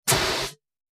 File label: Short, Light Fire Extinguisher Air Blasts, X5